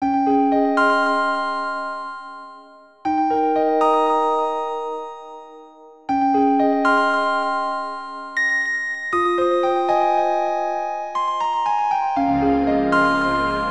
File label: Defeat; tangledeep original soundtrack